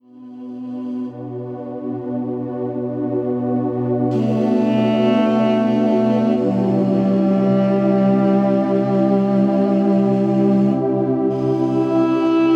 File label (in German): Schamanische Meditation; Entspannungszeit; Rückkehr zur Mutter Erde; Wellness CD